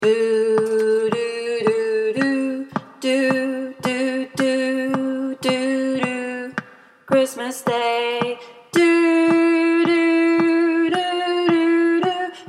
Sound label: alto; brightonswingchoir